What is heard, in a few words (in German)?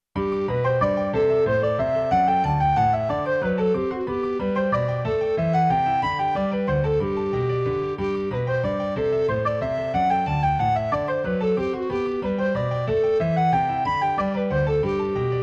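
Menuett Trio Blockflöte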